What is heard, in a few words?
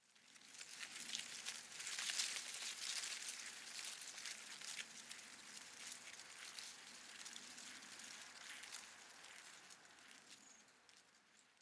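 shrub small leaves rustle soft, garden; haus-garten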